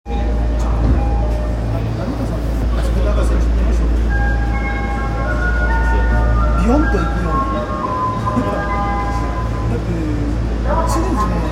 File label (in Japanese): 丸ノ内線西新宿東